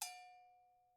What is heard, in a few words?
agogo Bell mf, Struck Idiophones; Agogo Bells